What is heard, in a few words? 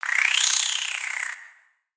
Spider Idle Bored; Lego; creatures mechanical